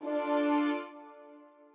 strings

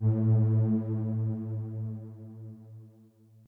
bass pad

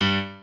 piano

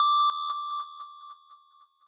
lead6

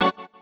Key organ; Luxembourg Zwee

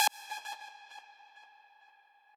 lead

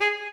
solo

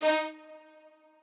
strings

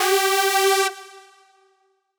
synth

